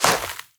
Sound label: scuff, footsteps player grass